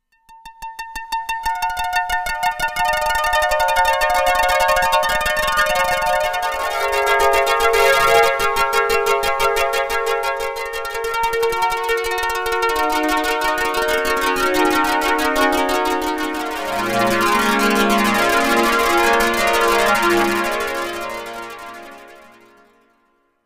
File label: Harpeggio; KORG Tube